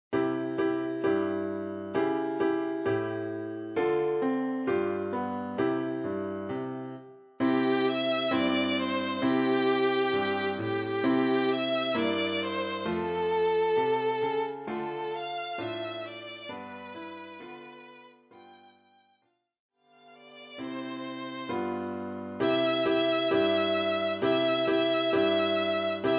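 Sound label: violin; Jingle Bells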